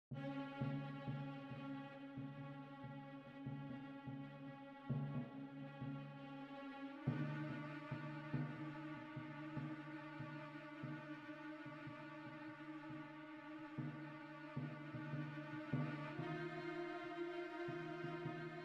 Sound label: Quiet panic